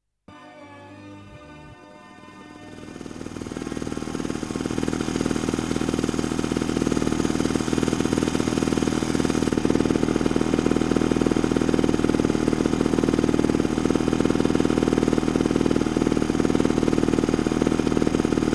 MOTORE A SCOPPIO

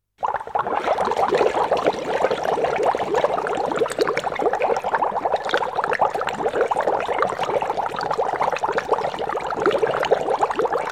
ACQUA CHE BOLLE

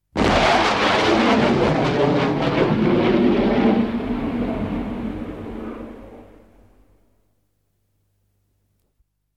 PARTENZA AEREO A REAZIONE

